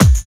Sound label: KICK 2; East West - Phat & Phunky CD2; 90's Sample CDs